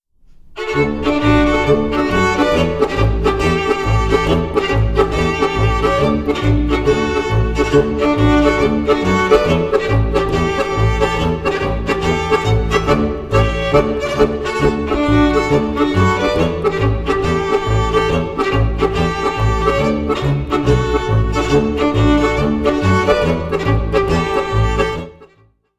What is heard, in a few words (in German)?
Polka; Hirschegger